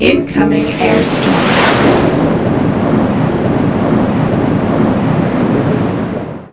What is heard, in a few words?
fly